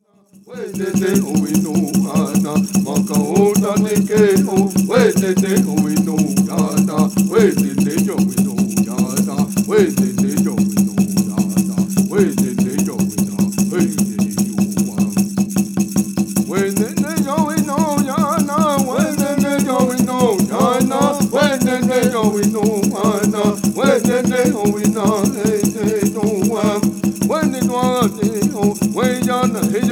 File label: Ceremonial Song